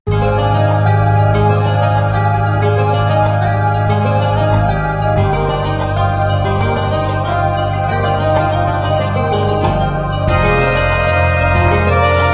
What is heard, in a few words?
Dungeon; Adventure Bar Story; Android game music